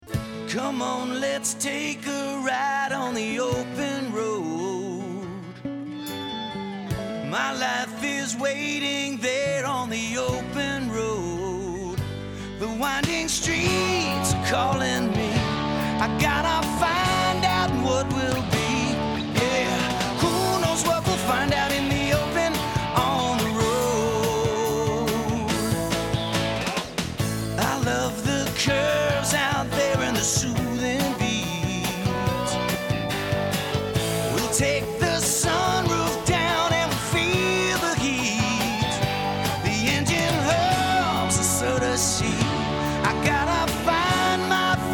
Open Road jingle

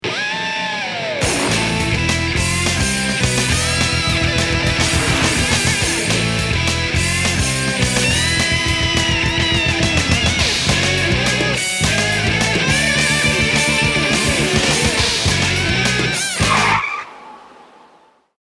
Heavy Rock

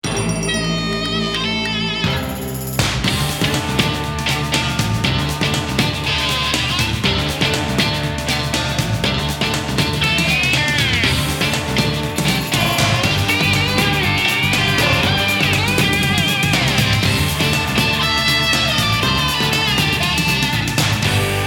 Western score; Dodge

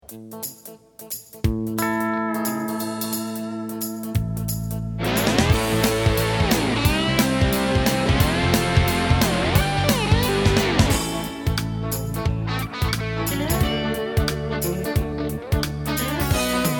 Guitar Boogie

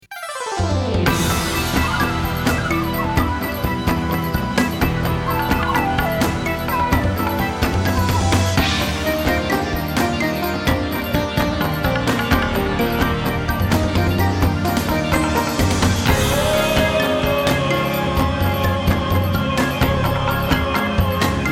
world score; MCI

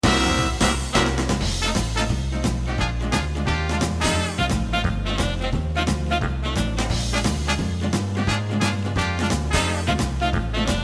big band swing; Coca-Cola

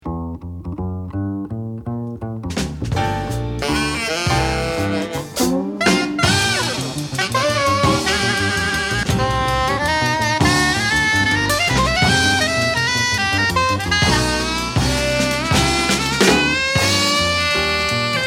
jazz score; Texaco

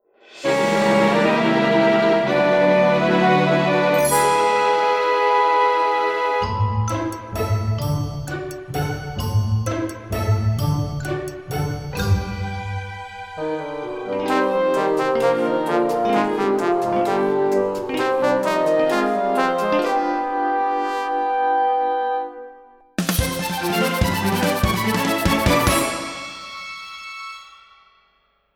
Orchestral Underscore

